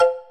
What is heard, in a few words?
button press; Dora and Friends - Dora's Wonderful Wardrobe!